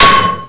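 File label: crowbar hit, weapons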